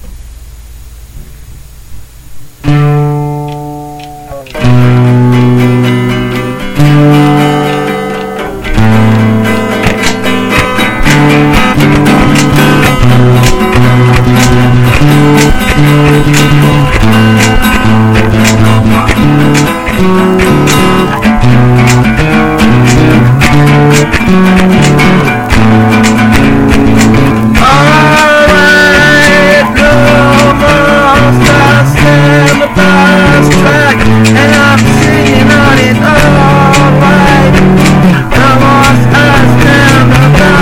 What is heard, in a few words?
Acoustic Bass